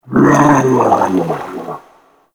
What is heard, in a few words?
Large Pain; Warframe; Shadowgrapher